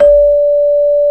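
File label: CELE LOW, percussion; AKAI S-Series CD-ROM Sound Library VOL-8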